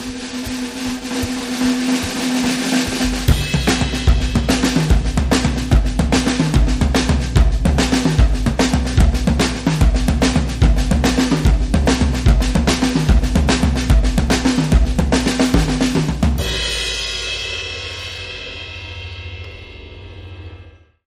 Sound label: Chemical Pad Drums Only